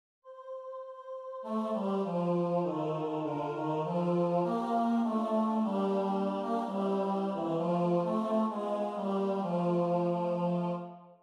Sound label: Tenor; I sne staar; i sne staar urt og busk